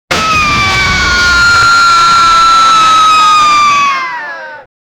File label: bomb going off and scream